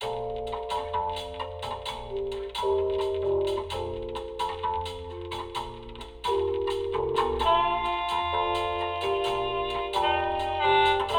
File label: muzak